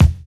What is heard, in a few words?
KICKING; Univers Sons - Kitch 70s